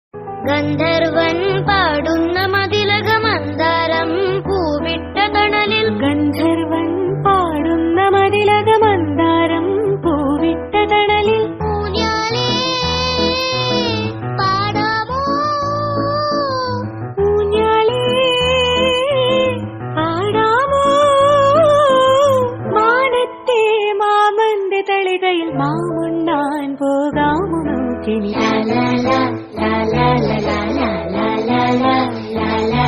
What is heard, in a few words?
bgm ringtone song